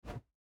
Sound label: mobs jump